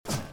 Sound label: grate jump; firefight